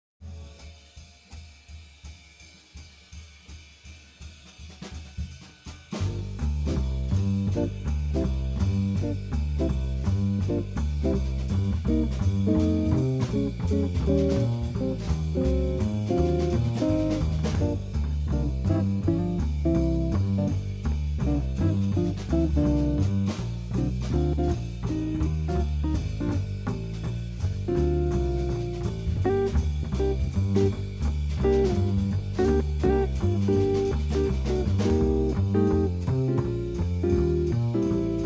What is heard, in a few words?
backing